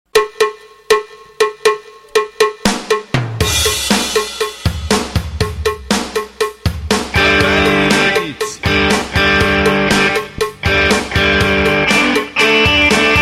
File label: Rock